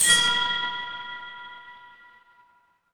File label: instrument bell